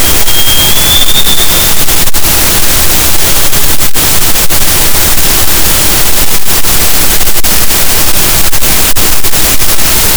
cachalot, gaussian noise; Physeter Macrocephalus

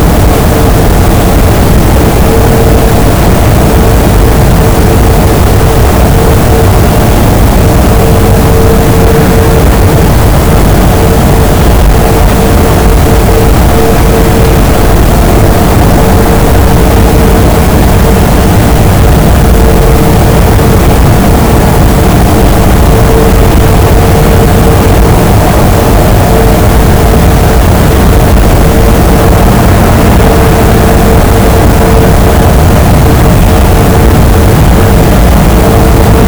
cachalot, gaussian noise; Physeter Macrocephalus

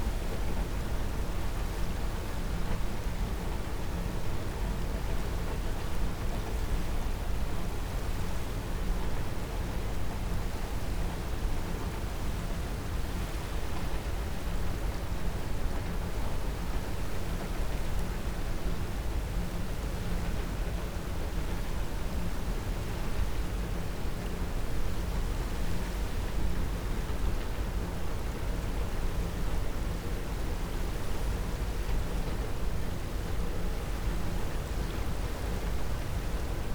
cachalot negatif, Physeter Macrocephalus

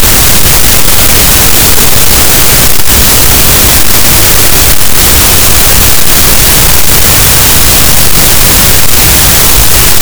cachalot, Physeter Macrocephalus, gaussian noise

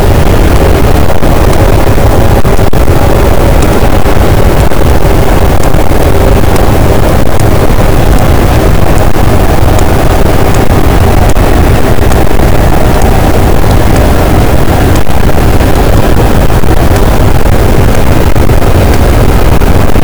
cachalot, Physeter Macrocephalus, gaussian noise

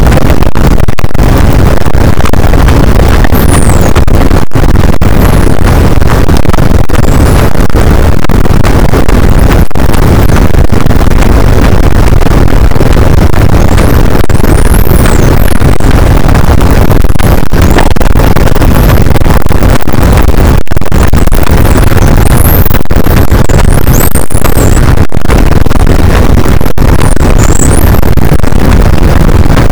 cachalot positif, boat; Physeter Macrocephalus

